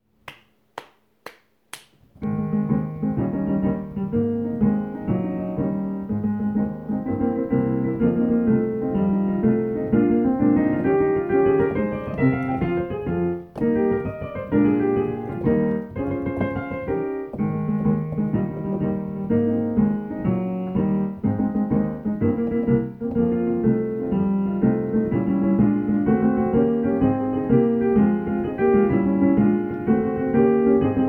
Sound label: tenor accompaniment